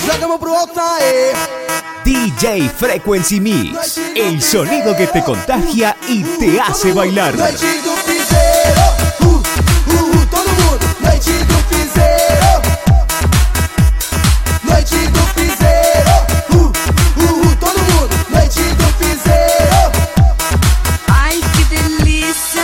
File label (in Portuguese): eletro funk retro